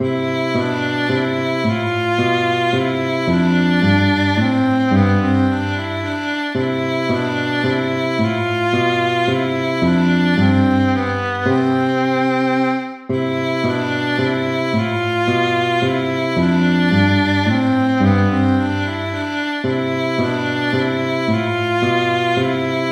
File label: tempo minuetto; hook james